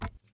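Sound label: Metal run left, footsteps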